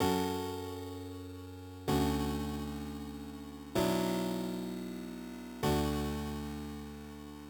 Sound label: crash